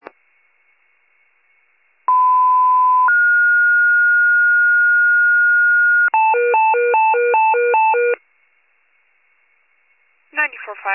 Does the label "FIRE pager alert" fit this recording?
Yes